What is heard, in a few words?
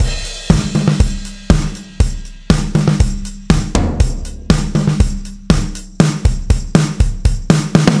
Warm